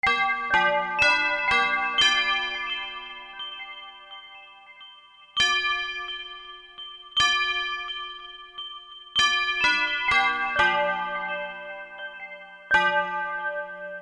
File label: Church Bell, Korg MSC-4S Orchestra; Orchestra 1